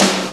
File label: SNARE, DrumBase5000; Crypton; CFZ KIT 2